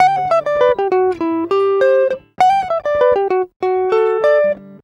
Acoustic Guitar 99 bpm G, African Guitars

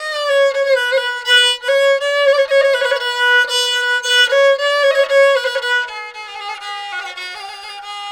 Chinese, Stringed Instruments; Sonic Foundry (Sony Creative Software); World Pop

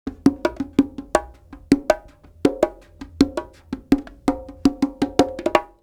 Live Perc Riffs; Jungle Frenzy 1

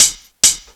HH LOOP; Ragga & Dub Reggae; HH LOOPS; AKAI; USB Soundscan vol.27

